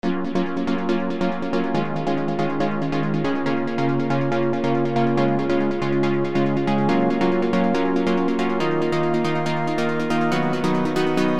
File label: chord pluck; rdgaudio; ez plucker